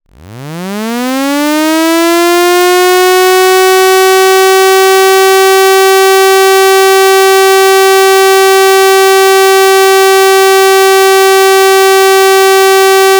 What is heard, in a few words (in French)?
French - Signal national fin alerte; Radio Voice Sounder with Beacon; SmartVOX